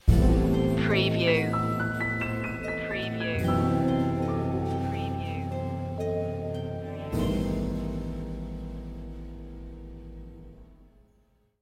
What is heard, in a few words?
magic stinger